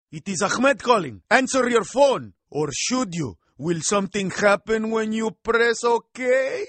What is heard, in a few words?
Is Calling Rock